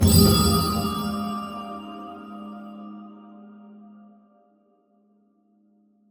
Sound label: Rune; The Dwarves 2016 ps4 gamerip